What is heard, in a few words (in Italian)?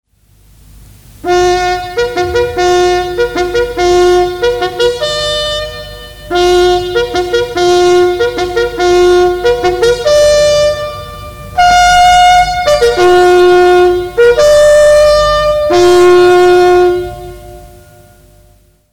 contrappello, squilli di tromba in caserma